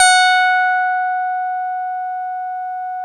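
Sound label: OB8 piano F#4, OBERHEIM OB8; Big Fish Audio - First Call Vintage Keyboards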